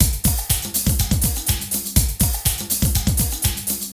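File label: drum loops, right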